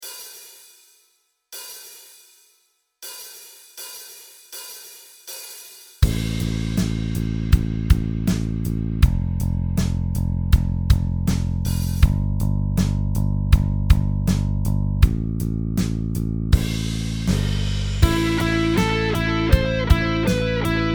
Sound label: Powerchord Song Backing Track